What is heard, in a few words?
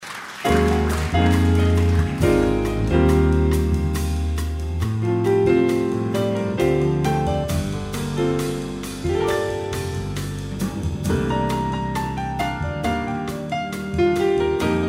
waterfall